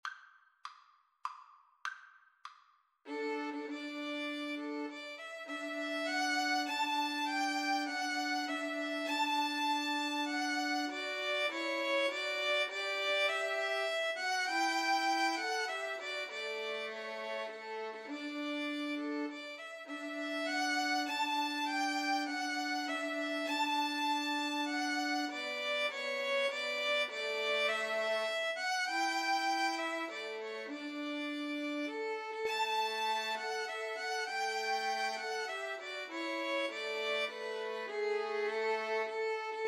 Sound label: nocturne VLNs